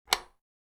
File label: switch